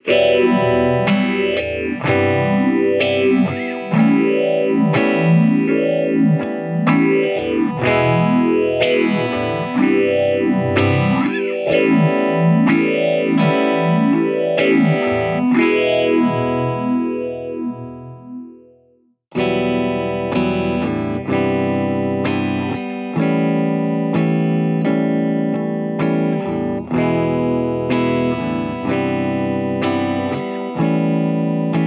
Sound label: Guitar Phaser; Pink Phase; H90